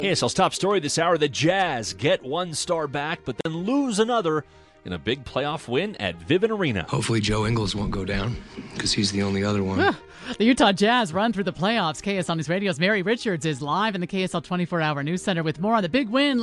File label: jazz beat